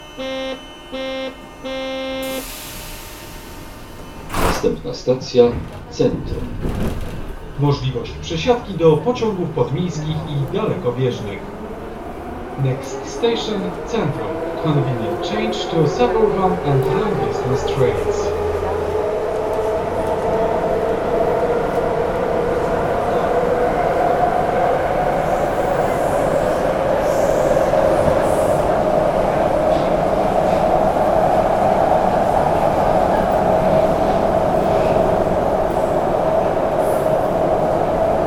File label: Metro Warsaw